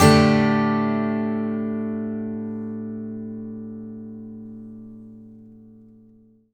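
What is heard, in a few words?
acoustic guitar D sus up, Whole Lotta Country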